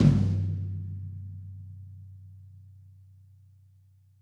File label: Tom Floor, acoustic kit